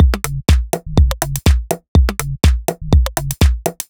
D 123bpm, House Drumloops; Frogslegs; Zero-G House Fabrik Drumloops

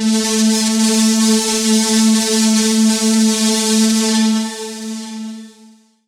technosyn2, Techno Trance; Ueberschall; SYNTH

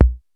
R8 DANCE KIT; Rubber Chicken Software Sample Bank; DRUMS + PERC

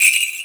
Jingle Bell, Korg 05R-W Korg Percussion; 300 Drum Machines

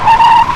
Skid Stop, Car Skids; Transportation

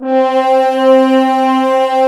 Brass F.Hrns C; Miroslav Vitous - Woodwind Brass Ensemble

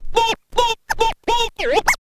scratch short, Vinyl scratches

